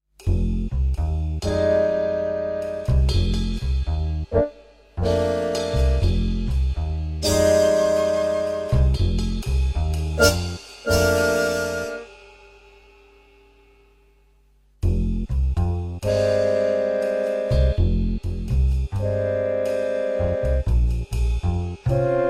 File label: brass minus